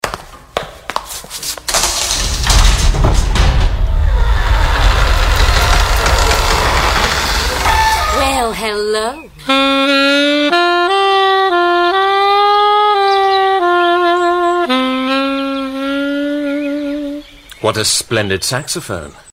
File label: sax